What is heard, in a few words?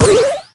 ball kick; Brawl